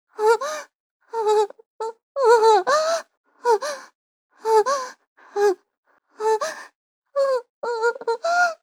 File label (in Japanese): お? おっ おおっ おほぉ…